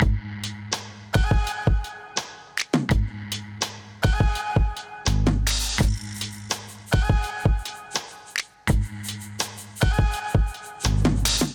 Kit Melodic 83 bpm, Drums; MIDI Clips; Fly Situation; Ableton; Chop and Swing

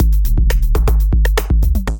Kit, Drums Hybrid; Onyx Kit; Ableton; Factory Packs; Drum Essentials

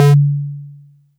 distbeep; LoFi Loafa; Ableton Foundation FreePacks; Wicked Kits

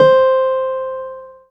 C4, Keyboards; Model-C; Ableton Foundation FreePacks

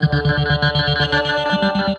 Shifted Rhythms, Vocal; Instruments; Vocal Racks; Root D; Harmonic Vocal Racks; Ableton; Factory Packs; Voice Box; Complex Vocal Racks

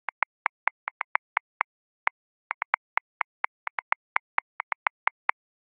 Breakin', Grooves; Ableton; Factory Packs

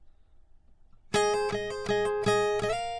080 Pop Ac-Guitar Fm; Pop Rock

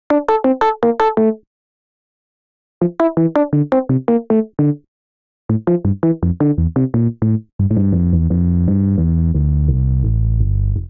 Smokey Keys D Minor 88 bpm; MIDI Clips; Tonal; Melodic; Ableton; Factory Packs; Chop and Swing

